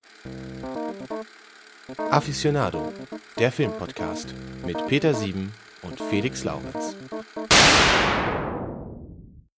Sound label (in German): Opener